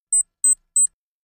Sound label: Alarm Synthetic; hi-tech various